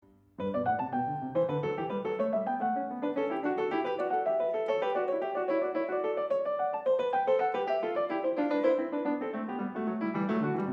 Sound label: Bach Goldberg Variations a 2 Clav, PIANISTS